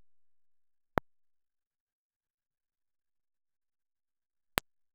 Martello PCB punta grossa - Legno + Marmo; Prove-Martelli

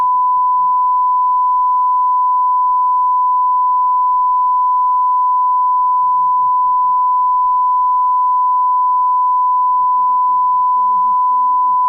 Calibrazione 94dB

